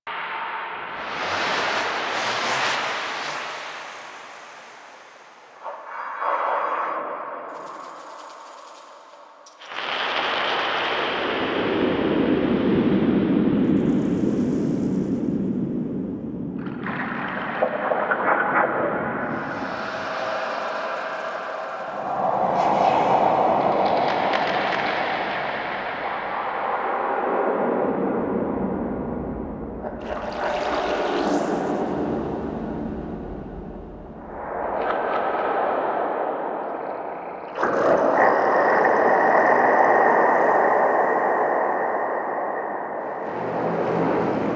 Vitruvian Museum Amb; Warframe

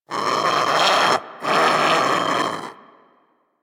Sea Bird Vocal Snore; Warframe; Hunting Critters; Ostron Sea Bird

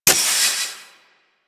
Heat Gun Mech; Grineer

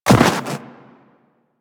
Run Snow, Footsteps; Warframe; Tenno

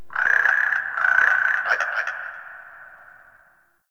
Swamp Croak Distant; Ambience; Midrath Island

